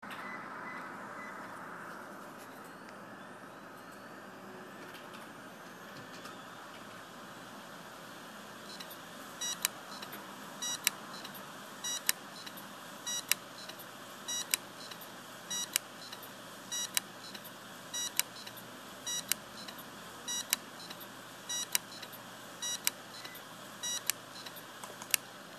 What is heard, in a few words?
Hitachi heads, damaged hard drive sounds